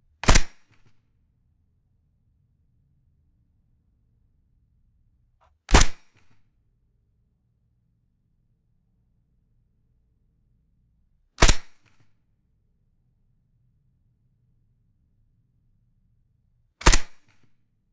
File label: suppressed m4 carbine single gunshots